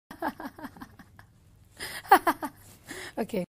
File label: laugh hehehe; cardi b